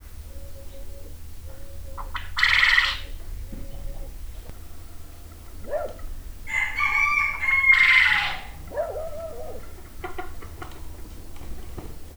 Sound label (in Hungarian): kutya baromfi, furj; állathangok; paraszt udvar